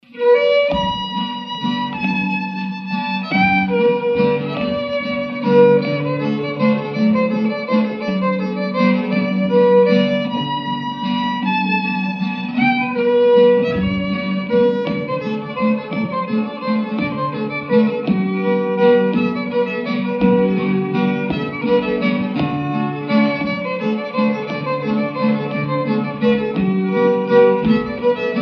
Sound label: Scandinavian Waltz